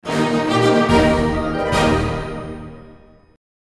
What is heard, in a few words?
Weapon Upgraded!; Ratchet and Clank A Crack in Time